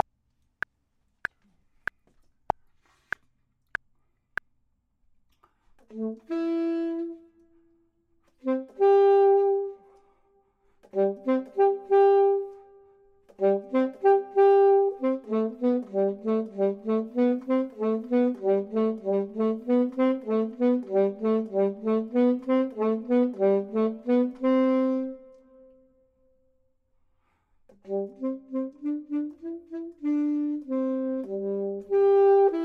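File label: bottom, Saxophone and Clarinet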